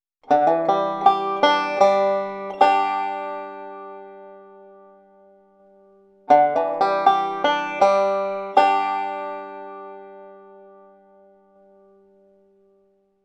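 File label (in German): roll mit hammer on und hammer on triole, banjo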